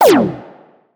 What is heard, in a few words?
Laser